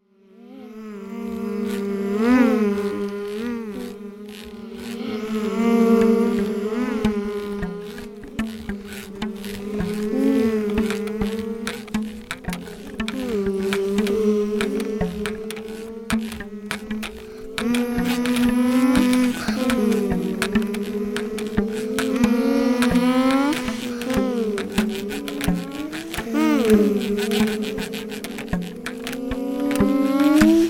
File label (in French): Les voitures